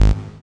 SYN POLY, JD 800; Sound & Vision - Gigapack I CD 2; JD 800/990

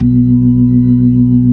B-3, Jazz

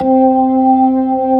LOW SLOW C4, ToneWheel Organ; E-MU Formula 4000 Series Vol. 3; Analog Odyssey

